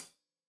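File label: hat closed, hi-hat cymbals stereo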